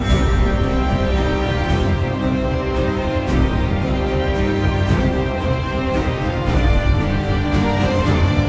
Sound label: Classical Voc